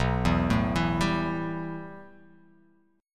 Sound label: B 7th Suspended 4th Sharp 5th